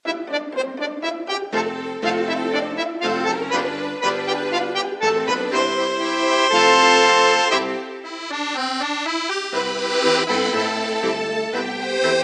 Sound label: tango